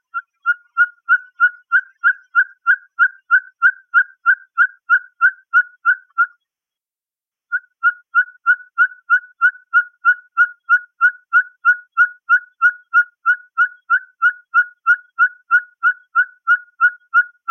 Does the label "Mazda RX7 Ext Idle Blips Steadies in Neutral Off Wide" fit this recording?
No